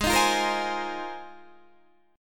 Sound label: Ab 6th Add 9th Ab, Guitar, DADGAD